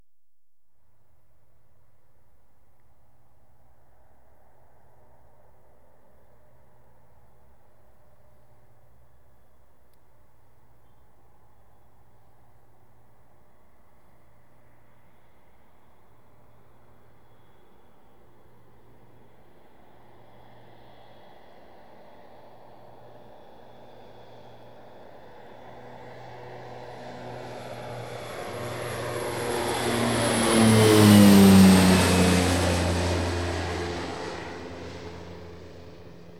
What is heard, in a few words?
APPROACH TO LAND, LOW PASS, Aircraft; The BBC Sound Effects Library